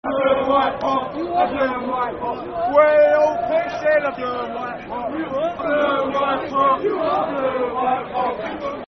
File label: Football Chant (Soccer Songs); Blue And White Pot; Fulham Ffc